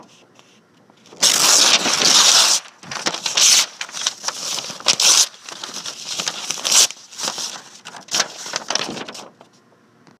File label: Paper rip